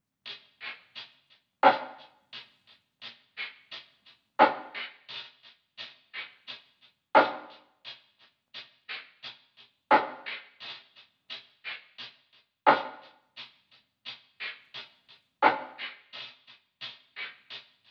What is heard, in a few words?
87 BPM D# Min Percussion; Stems; Cymatics; Around The World